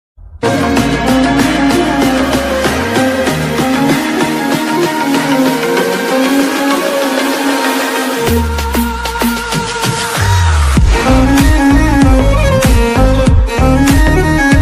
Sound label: ringtone bgm ringtone english ringtone instrumental ringtone; joker